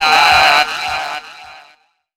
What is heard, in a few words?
"ah"; ooh ahh